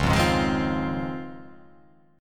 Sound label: C sharp Major 9th